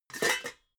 pots clank soft

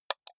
computer mouse left click single hard

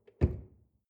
drawer large close lock

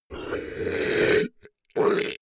beast choke deadly

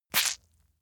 leather impact mud medium squeeze

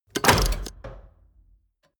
mechanics small reverberant

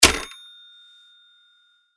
receiver drop

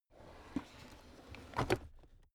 drawer cabinet stuff open soft

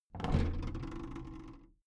big plastic case lid lift soft

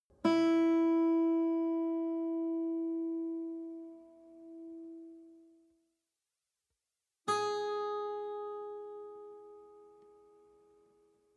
western guitar single note high

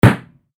balloon burst

